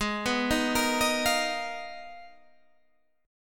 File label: G sharp Minor 6th Add 9th G sharp Guitar Standard, chord; G#m6add9 arpeggiated; 16,14,13,15,16,13; JGuitar